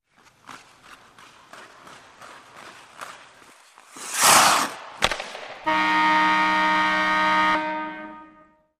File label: Hockey Blade Skate Up